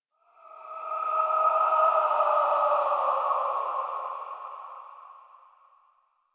Wind Death Howl; Ambience; Misc Outdoor; Warframe; Infested Eris

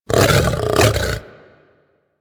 Feral Vocal Leg Pull; Warframe; Kubrow

